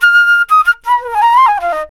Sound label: flute, D brass licks